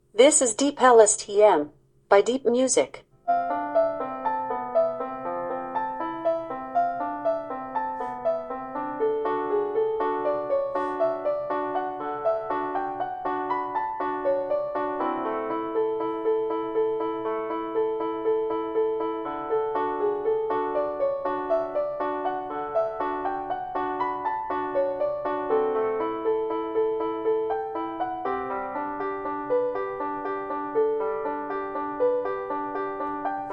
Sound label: Alexa deep music